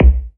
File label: BD, BASS DRUMS; EdgeSounds